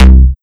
Kick Dist Bass Kick; Staticpony1

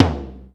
Tom Mid SL; Millo V3

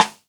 Rim Rimstick; Lightning1024

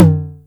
Tom Mid, TR909

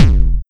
Kick Dist EDM Hard; Waveplay

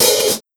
HiHat Foot; Millo V3

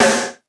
rock snare; foxdot default

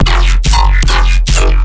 Sound FX Error

